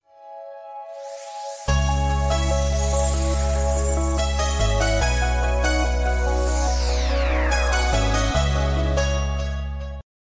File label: new iphone 5 ringtone